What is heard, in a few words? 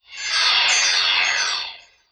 potion chimes; Politburo